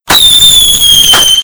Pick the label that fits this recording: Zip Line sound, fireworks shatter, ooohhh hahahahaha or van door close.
fireworks shatter